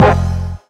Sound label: Stab Brass Band; Ableton Live 12 Lite; Core Library; Prommer